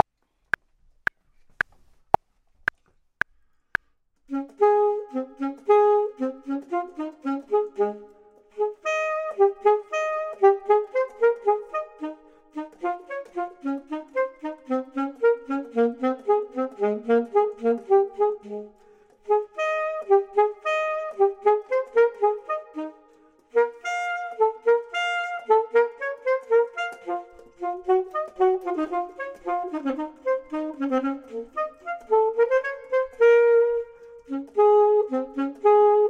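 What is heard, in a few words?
top, Saxophone and Clarinet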